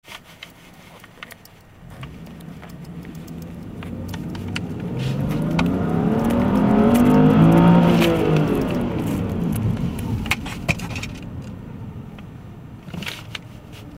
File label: 7000rpm inside; 30R spec B